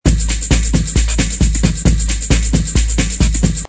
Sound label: drum loop